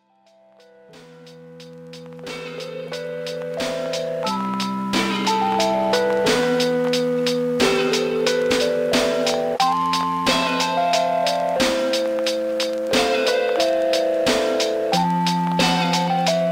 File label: Mindful Funk